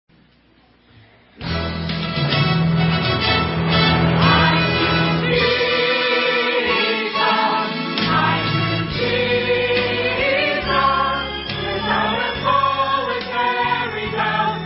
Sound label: choir